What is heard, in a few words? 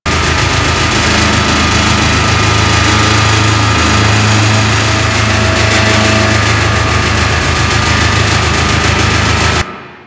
Retrieval Tram Loop; Missions